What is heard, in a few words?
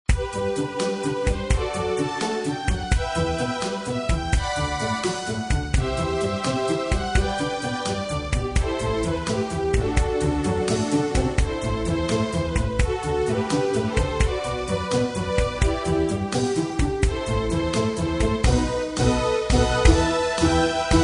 slowrock; Slowrock Demo Edit